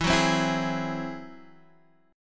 Ab Augmented 7th E, guitar

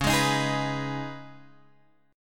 C sharp 7th Suspended 2nd, Guitar, Open G; down; chord shape

